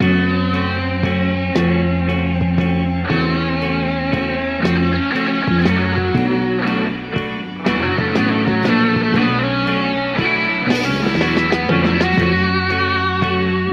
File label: guitar, Phonky loops